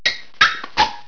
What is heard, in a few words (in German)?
rollo